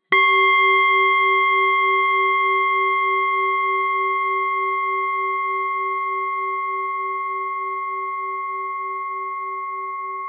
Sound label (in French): Bol Chantant Tibetain Bouddhiste